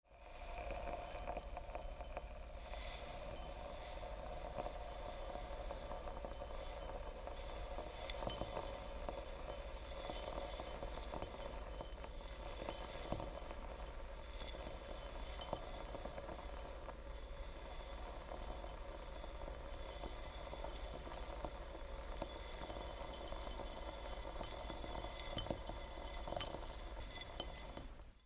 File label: Sand Spill